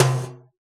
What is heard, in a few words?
latin kit timbali